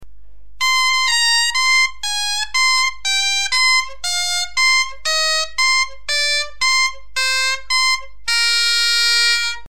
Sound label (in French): descente octave, bombarde; Descente progressive